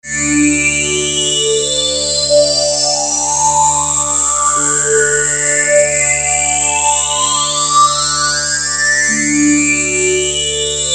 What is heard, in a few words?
multisweep resonant; atmosphaeren umwelt